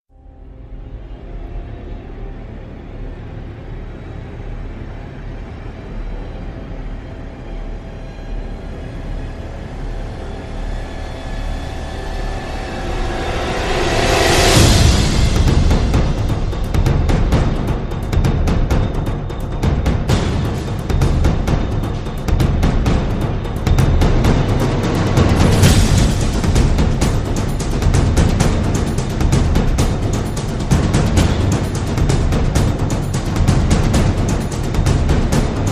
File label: Drums